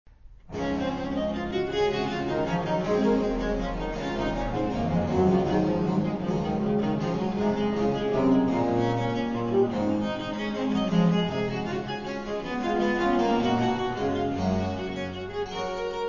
D Fantaisie; Marais

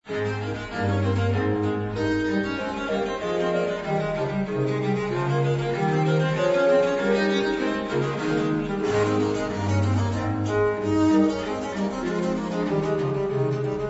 Finger Pastorale; barocmusic

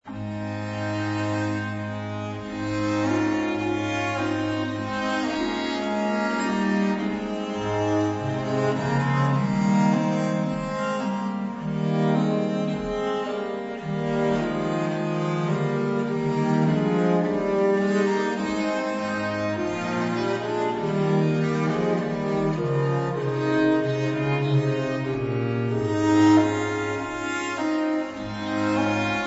Finger Pastorale; barocmusic

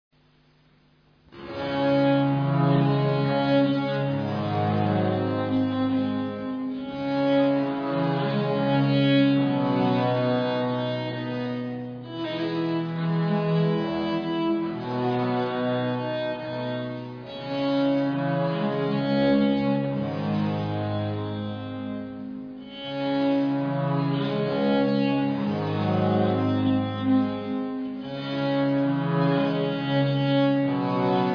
folia; Marais

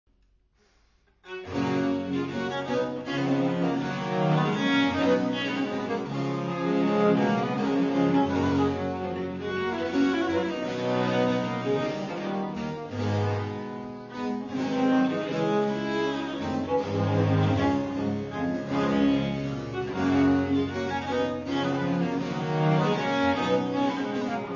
courante, sol M; Marais

